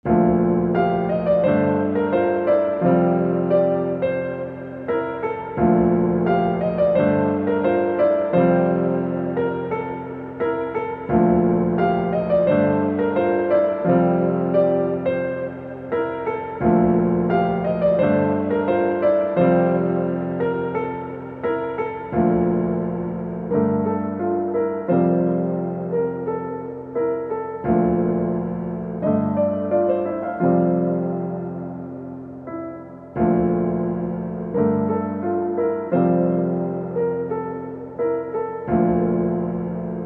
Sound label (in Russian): очень классная пианинка